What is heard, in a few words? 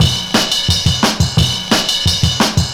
175, Breaks; Drum&Bass; EZ Rollers